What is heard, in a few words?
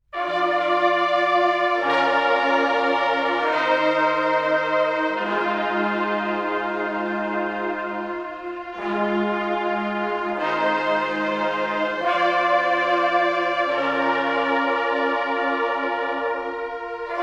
Tower Chimes; Alma Mater